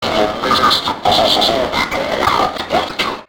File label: alert; slender